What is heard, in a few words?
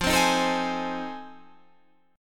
F 7th Sharp 9th, Guitar, Half Step Down